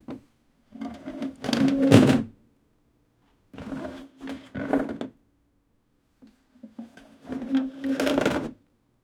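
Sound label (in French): une chaise qui est traînée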